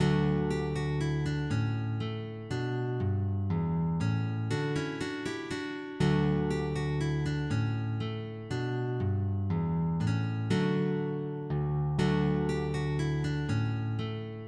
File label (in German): Menuette